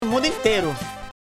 life bgm; final fight 2

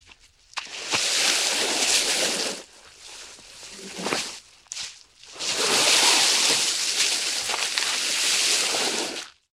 dragging heavy object

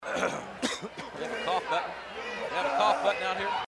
cough

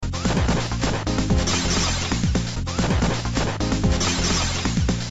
phone kick audio; Streets of Rage Phone Kick 2

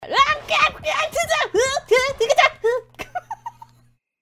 simply grunting

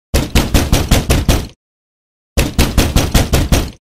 heavy knock; Heavy Door slamming

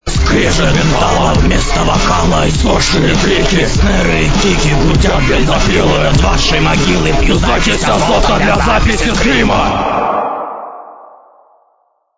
dead chorus

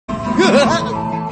uhaha

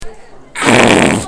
biggest fart

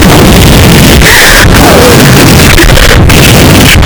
beat chill

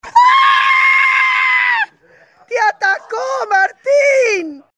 ahhh

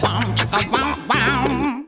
boom chicka wah wah

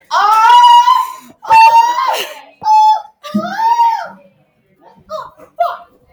moans

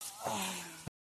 ahh

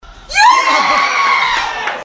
scream

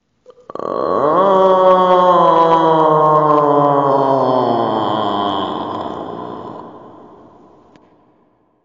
Moaning Sound Effects

